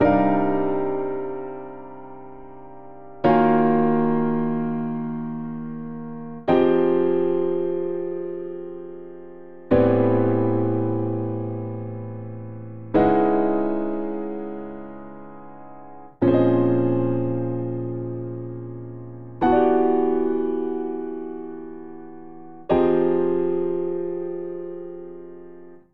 Progression Jazz Thick Voicings Minor 11 74 bpm, Grand Piano; MIDI Clips; Ableton; Factory Packs